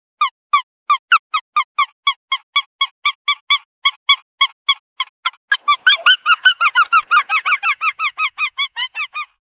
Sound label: joyful, tail wag